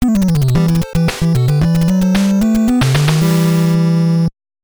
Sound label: Unknown Fanfare; Advanced Dungeons & Dragons Heroes of the Lance; NES